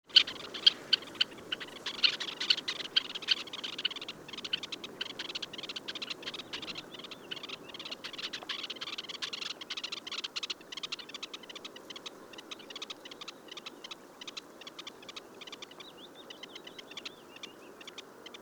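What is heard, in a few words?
red-necked phalarope voice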